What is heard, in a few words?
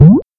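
double jump; cstrike; jb engine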